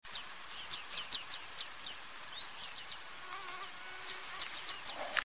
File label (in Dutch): Baardman, trekroepjes